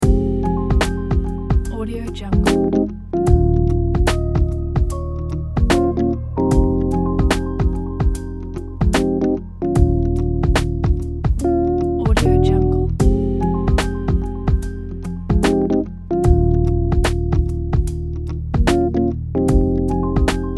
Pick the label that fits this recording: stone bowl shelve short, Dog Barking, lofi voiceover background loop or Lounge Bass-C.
lofi voiceover background loop